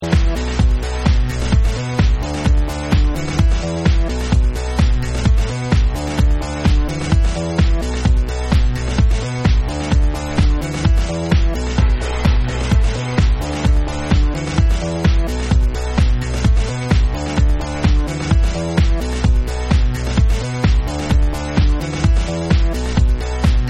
Instrumental Remix Track

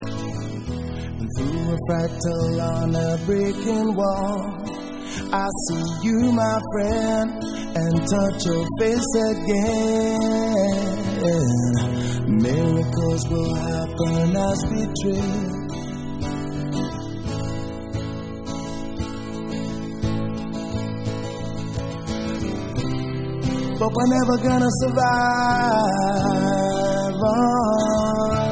Acoustic Instrumental Version

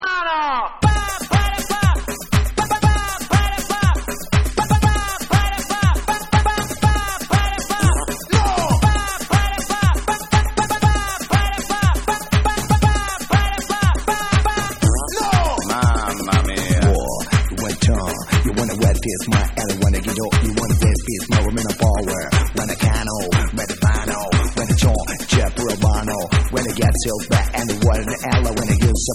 Dagadrum

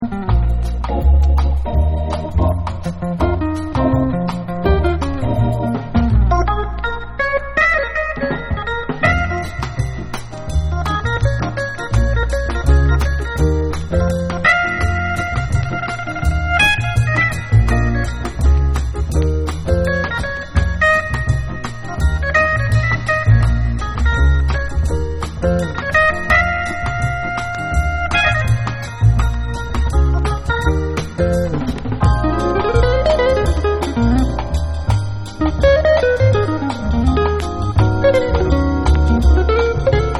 QUARTET Dirty Old Bossa Nova

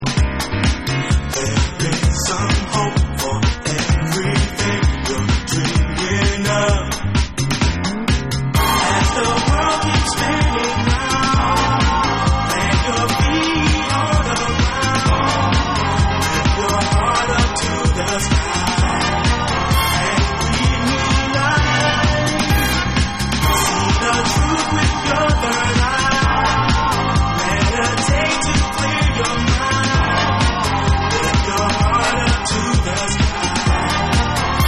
Vocal Mix

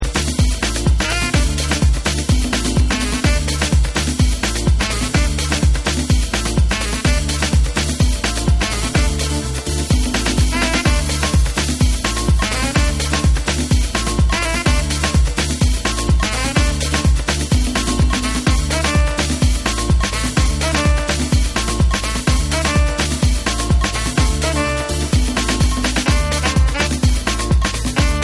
Live Guitar Version

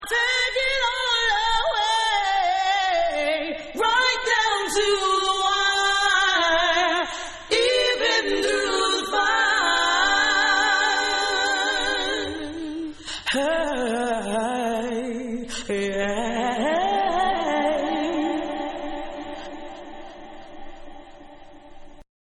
Acapella

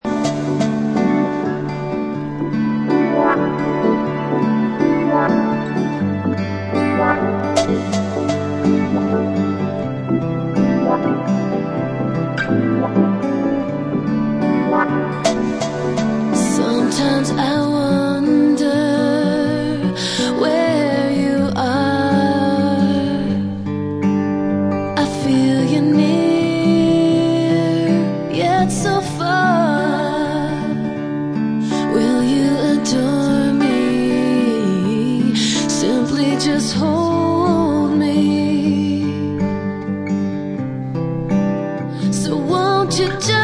Acoustic Mix

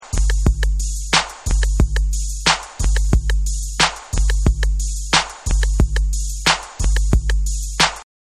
Breakbeat

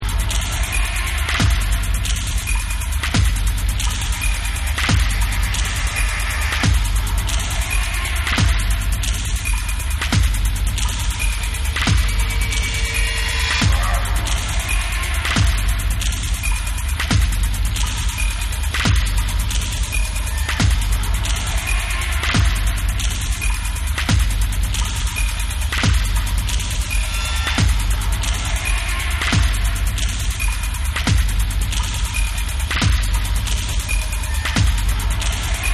DRIP DRIP DRIP

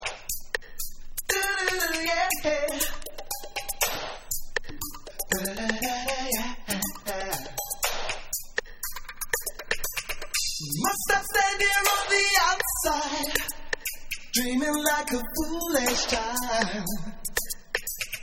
Accappella Beats

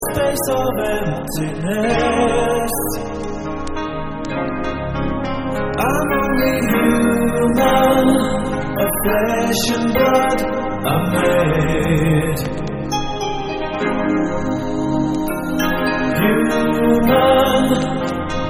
Acapella Version

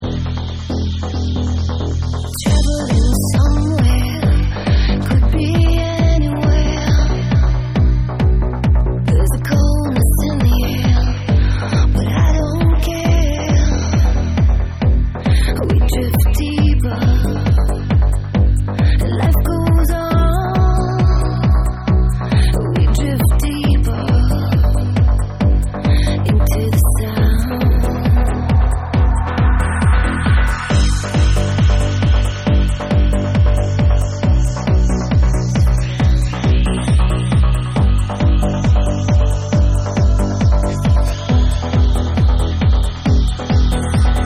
Sweeping Strings Remix

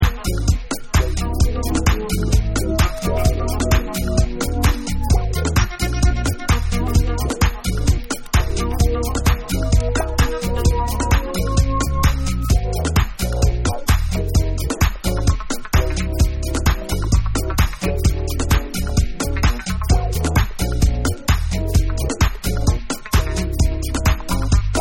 Instrumental Mix

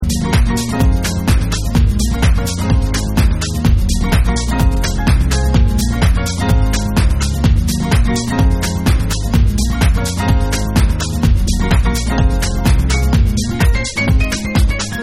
Latino Jazz Dub

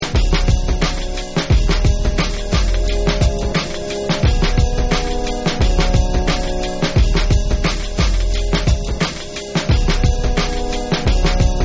Instrumental

